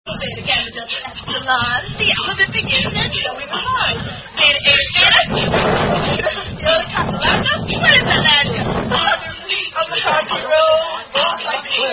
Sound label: The Greek Alphabet Song, Variant Rap Rhyme Version